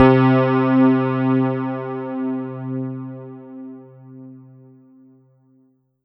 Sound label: Alesis S4 Plus Dyna Roadz C3